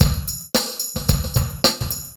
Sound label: Rock Tweak, Funk Beats; Sonic Foundry (Sony Creative Software); Synchro Funk